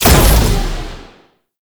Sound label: Shot; Warframe; Weapons; Strun; Strun Prime